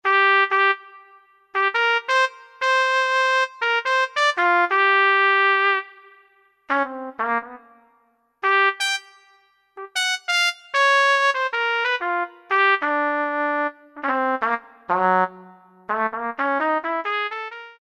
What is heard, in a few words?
Yamaha TG500 BR Trump